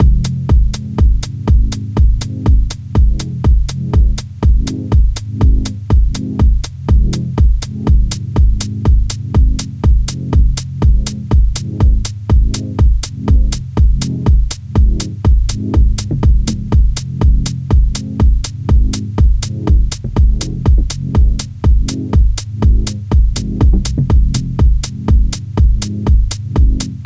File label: minimal loop